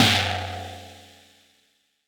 FX tom, drums acoustic; USB Soundscan vol.10; AKAI; 90s sample CD

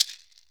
MARACAS; Sonic Reality; Interactive Drum Kits

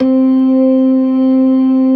LOW MAN, ORGAN; Keith Emerson; C3 Invasion; ORGAN SPLIT2

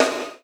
GATE SD, Drums Acoustic; USB Soundscan vol.10; AKAI; 90s sample CD; GATEKIT 3

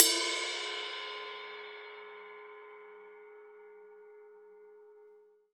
RAW RIDE, Drums Acoustic; USB Soundscan vol.10; AKAI; 90s sample CD

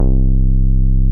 MOOG HI C1, ANALOG BASS; Music Store - Claudius Diemeter Schwerlos

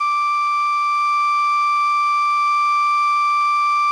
CALLIOPE D4; Sonic Reality; American Heartland

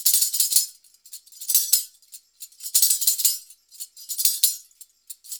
089 FREES, Modern Percussion Loops

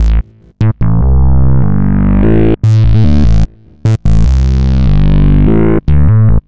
Synth Bass

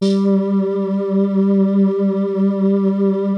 PERU LEAD G2; Music Store - Claudius Diemeter Schwerlos; FINE ART 3

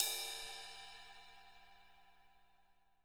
CRASH tip, drum; KITS-LARGE

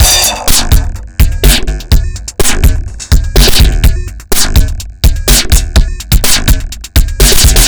Drums Strange; Sonic Foundry; Machine Language

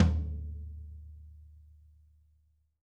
tom, drum

